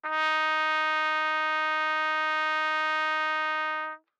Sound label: Trumpet novib ff Eb4 stereo, Bb Trumpet; MIS Pitches - 2014; Brass